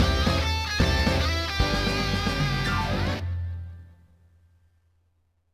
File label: BGM Result Good Game Clear Intro; Glitch Busters Stuck on You